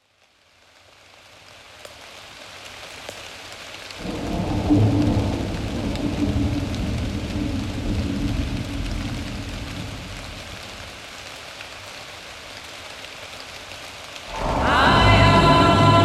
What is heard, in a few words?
Raccoon Song